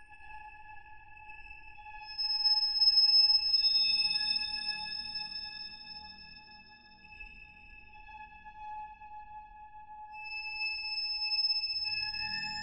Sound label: Hall Faucets, Dreamscapes; Digital Rain Lab; Dreamscapes vol.1